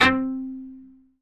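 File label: C3 SPICCATO, CELLO; Northstar; New Gold VOL-2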